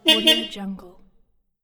forklift horn

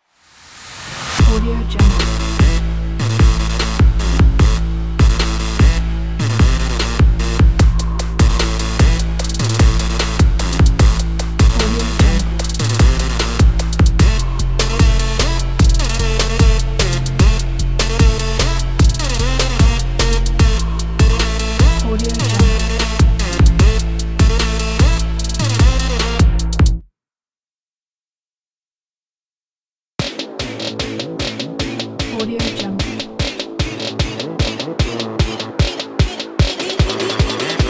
trap intro

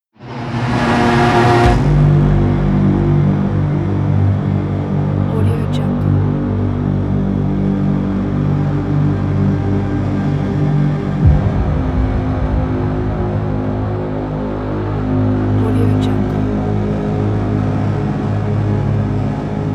suspense trailer

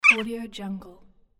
jackdaw bird single creak

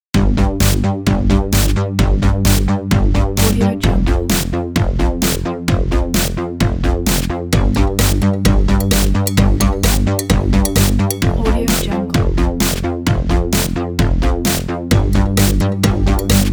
retro synth; prettycoolmusic

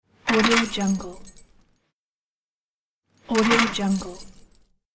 jail door lock

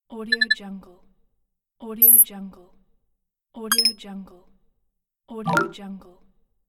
rollover menu

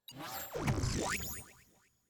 transitional confirms

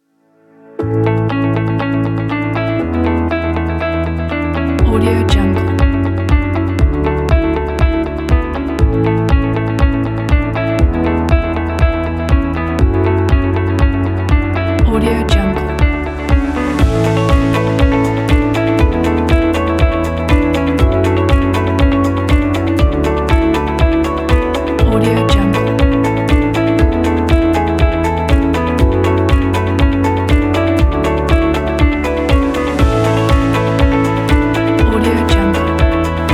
corporate music; backgroundaudios

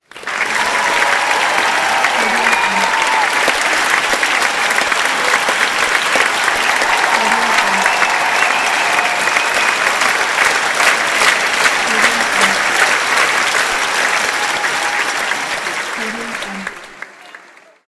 crowd cheering and applauding

